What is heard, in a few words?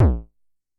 Drum, Vermona DRM1-MK2, Kicks